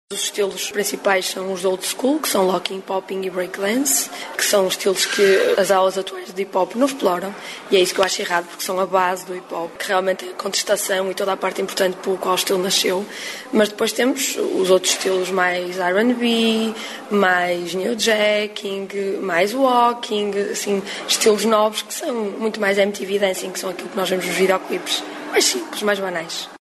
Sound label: hiphop